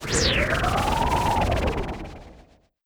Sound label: wind magic tornado